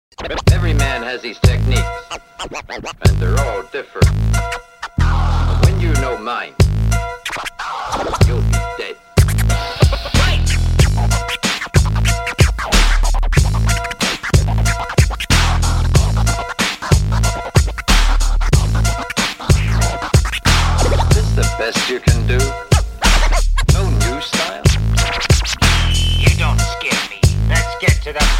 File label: turntable scratching ringtone